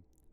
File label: SLURP, Security office guy; EndlessVendetta; Voice Lines